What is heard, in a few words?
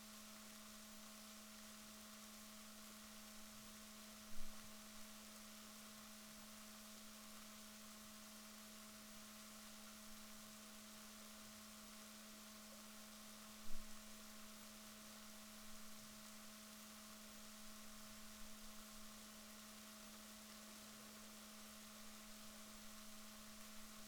rorquals positif, Balaenoptera Physalus Chile